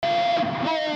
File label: loop